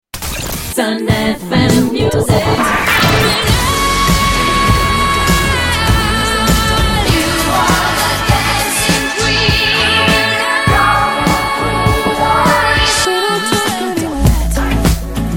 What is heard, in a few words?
Montage 98 Bpm; Sun Fm